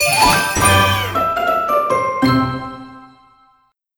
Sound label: Crunchy Finish